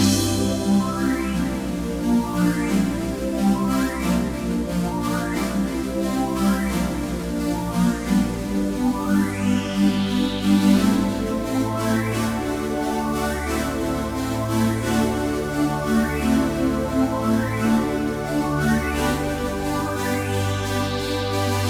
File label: Retro Space Hero, sci fi